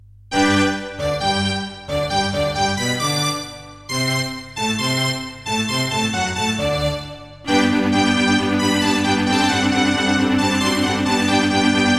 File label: Yamaha DB50XG GS MODE; Mozart - Eine Kleine Nachtmusik partial; classical